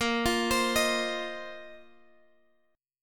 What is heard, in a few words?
A sharp Suspended 2nd Suspended 4th A sharp, Guitar, Open G